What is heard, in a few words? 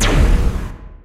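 scene change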